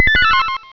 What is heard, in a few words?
rg 5 ton